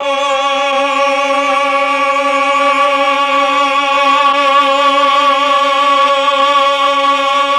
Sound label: CHOR; ILIO; Propeller Island Legendary M400